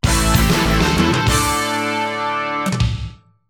READY, SC-55mkII; Overtake

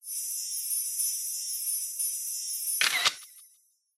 wind-up camera; Mystical Ninja Starring Goemon; ebusimaru

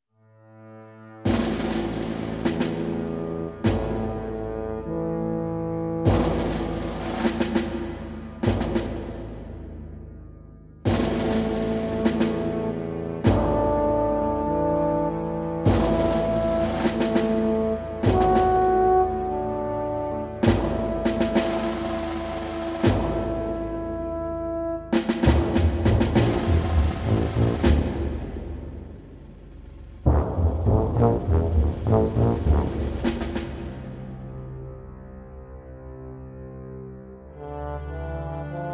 war ambience

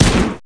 fire 9mm

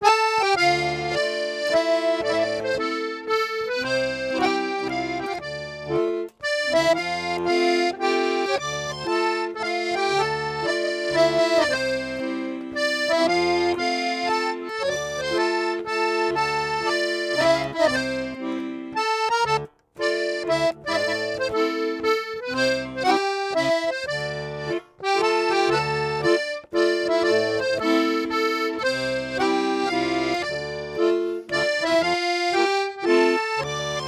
Accordion; Assassin's Creed Syndicate

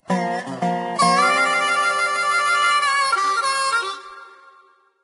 Music Intro; Diner Dash Flo on the Go; NDS

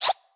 Join Team Two; boomerang fu

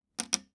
Poker Chips; This Is the Police

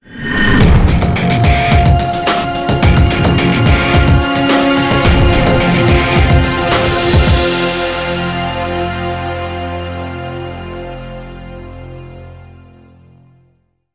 spawn

